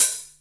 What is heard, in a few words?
old school sizzle high hat sample B key, pedal hi-hat one shot